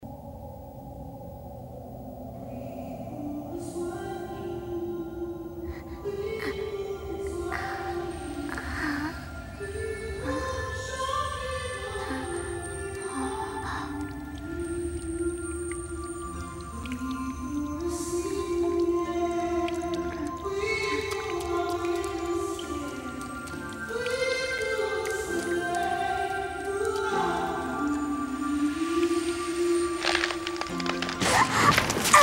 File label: chant, fr